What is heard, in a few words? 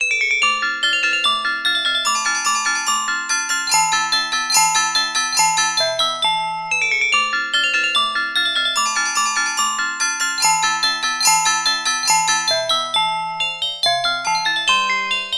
glockenspiel